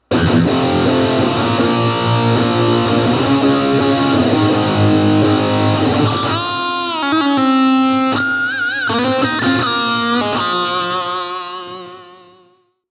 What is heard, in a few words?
cube 15 metal